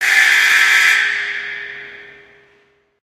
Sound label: bomb warning; Source Engine; tf